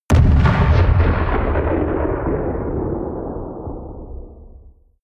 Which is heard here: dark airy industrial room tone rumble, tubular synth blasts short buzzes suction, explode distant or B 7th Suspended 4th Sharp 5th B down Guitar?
explode distant